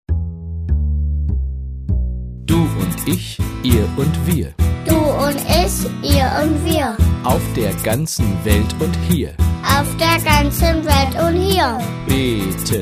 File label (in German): tischgebet mit gesang